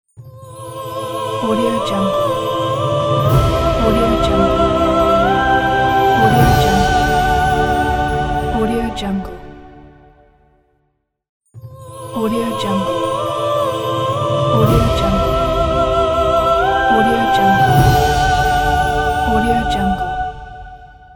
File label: epic vocal intro